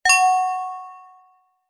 multimedia alert notification basic bell synth positive chime; zapsplat